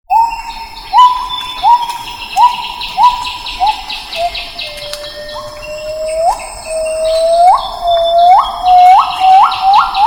Sound of Black-headed Bulbul at Pinang Jatus, Gunung Lumut; Awang Rusli Bin Ma